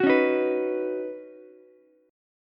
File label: Eb Minor 7th Sharp 5th, down, Ukulele; strummed; chord; JGuitar